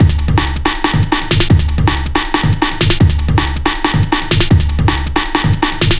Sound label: 160, Drum Loops; Total Drum&Bass